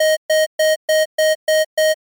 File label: alarm clock